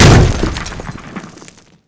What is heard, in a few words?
brick hit; tf2classic